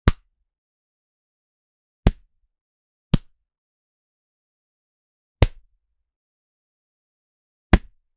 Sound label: heavy meat slaps